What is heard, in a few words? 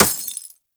Bullet Impact Glass Large; Weapons